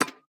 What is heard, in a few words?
Peg Leg Footstep